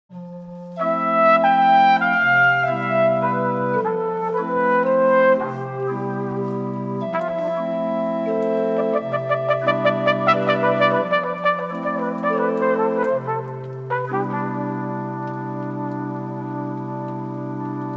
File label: flugelhorn; E. Satie